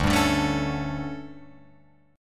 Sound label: D Major 7th Suspended 4th, Guitar, Open F; DM7sus4; strummed; JGuitar